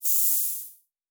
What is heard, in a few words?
Air Hiss, Sci-Fi Sounds